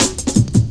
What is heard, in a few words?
Build Up Cut, Drum Loops 166.5; Zero-G Jungle Warfare 1, 2 & 3